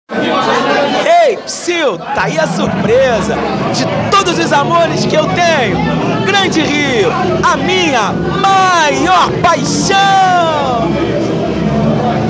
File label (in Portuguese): grito